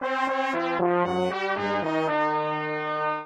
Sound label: randomly generated music